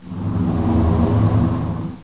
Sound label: scary, ambience; XDM